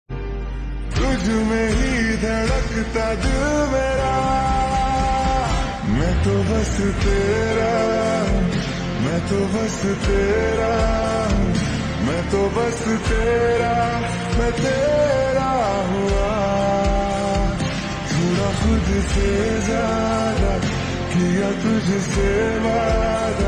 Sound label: Slowed Reverb Ringtone